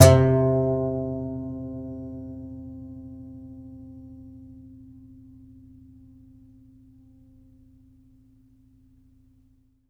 C. Bass Balalaika, Plucked Strings

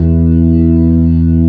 B3 E 1; Best Service; Advanced Media Trax Modern Composer; B3 SMALL2

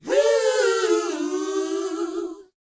LET, Vocal, R&B Dance, DANCE 120

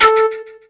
ethno, ethnic; Techno Trance Essentials

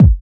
BD, BD Dance; Sound & Vision; Gigapack I CD 1

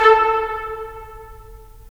BRS, Tps Stacc; Roland - LA Composer vol. 4

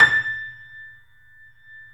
Small Pianos, 3-D Audio Collection

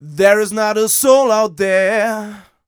m 127 mel a, Vocals

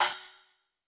Crash G2, Roland U220

